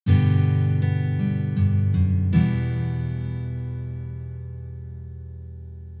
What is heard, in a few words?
Bass Run